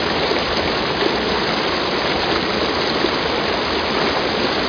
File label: water; outlaws